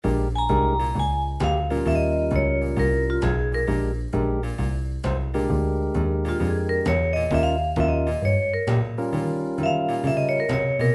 blues, midi; song book

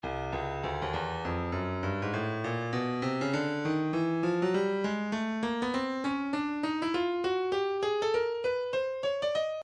percussion midi files triangle 100 triangle; Stylus

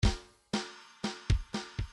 bdf midi file grooves rock b rock b

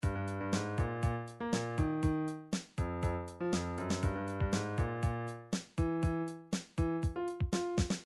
midi drums drums funk

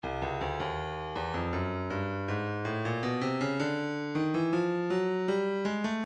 breakbeat 080 bpm; modren f; Stylus Breakbeat Midi Files

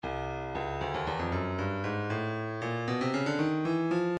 breakbeat 116 bpm; Tikaboom D; Stylus Breakbeat Midi Files

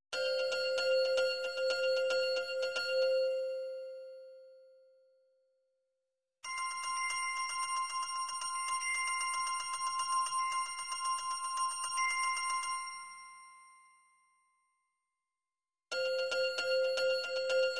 midi drums

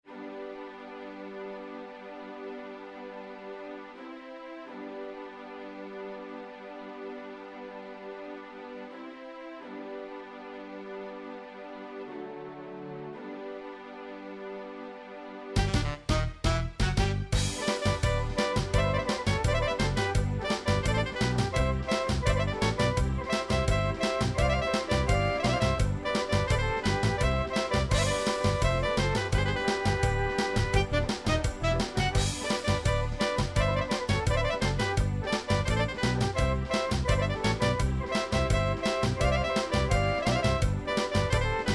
Folk Srbije Kiuchek Fast, midi

